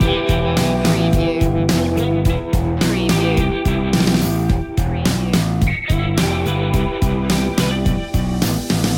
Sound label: indie style loop